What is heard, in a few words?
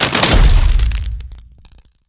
thunder wave; battle